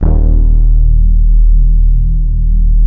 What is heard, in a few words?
NEON D#5; Northstar - New Gold VOL-1; M1+D50; NEON AMS